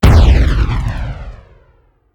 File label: space leap land; smite; Anhur